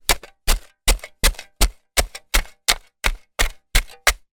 industrial shovel dig into soil multiple fast

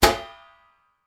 household bin lid metal close; sound effects one

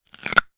human bite carrot

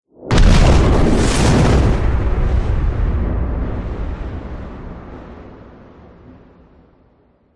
explosion designed large heavy with shockwave

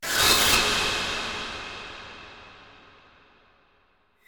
Transition Slash; bottlerocket

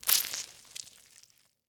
Squeeze Orange; Blastwave FX

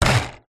table soccer game bar spin

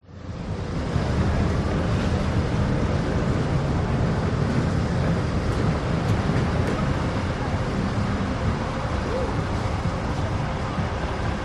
Amusement Park; audio hero

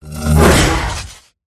whoosh; Audio Mangler

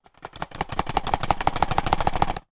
technology game disc case shake; nintendo wii

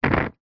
nature fire flame candle blow out

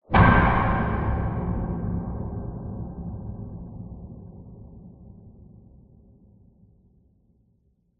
sound design cinematic hit metallic loud distant; zapsplat

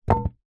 cd disc drop carpet; household

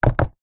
household chair sofa wooden legs set down ground

